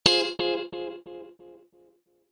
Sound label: Analog Delay